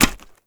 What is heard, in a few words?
Bone Effigy Impact Hit; Gameplay; Warframe; Tau; Destructible Dynamic